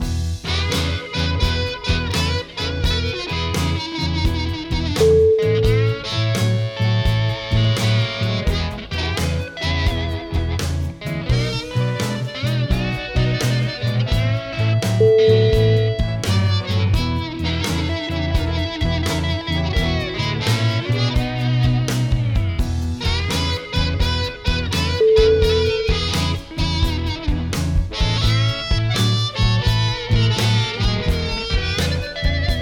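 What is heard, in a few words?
bar room blues, music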